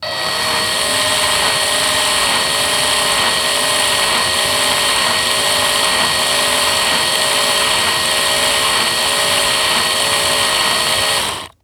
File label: Industry Tool Power Drill Low